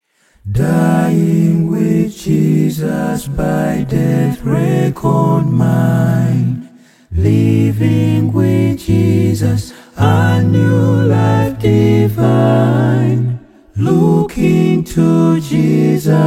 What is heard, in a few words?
Acapella